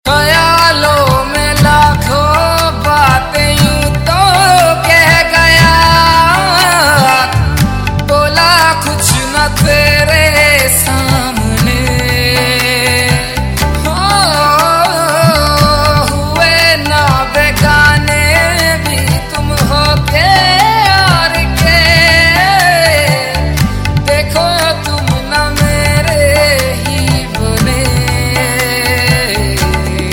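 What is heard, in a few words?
Ringtone, Punjabi Pop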